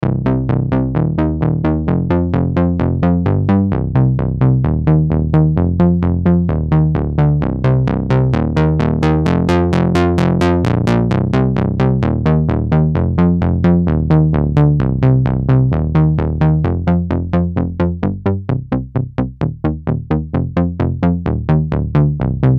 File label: Bass classic saw